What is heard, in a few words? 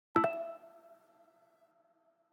streaming complete